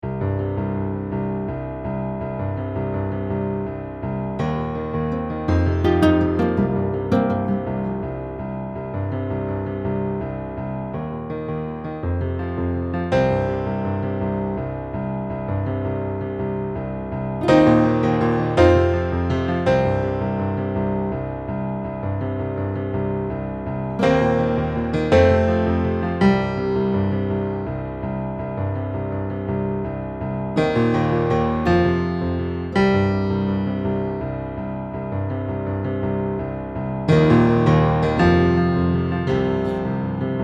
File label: Acoustic